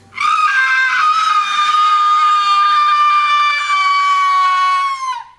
scream; Grinch

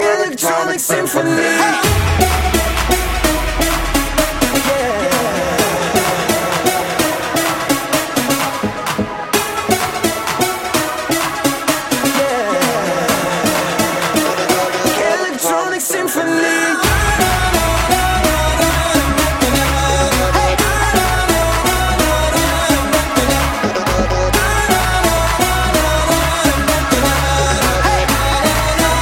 Electronic Symphony

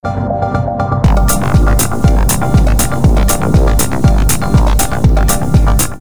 Digital Tune

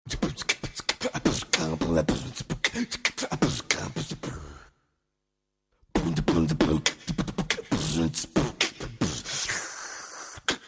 Mouth Music

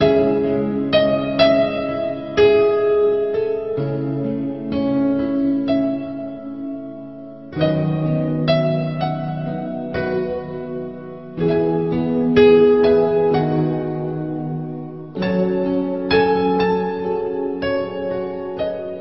Classical music

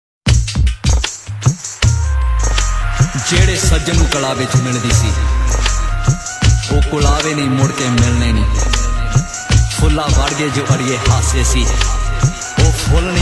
Punjabi

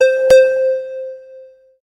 Sms Tone

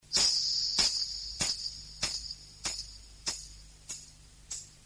Nice Sms

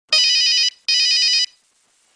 Nokia Ring

